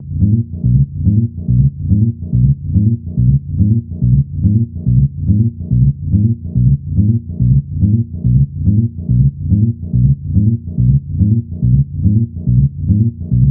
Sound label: Bass Loop 142BPM, groove techno